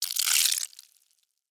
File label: Blood Squirt Spill; Haunted Bloodlines; SFX Producer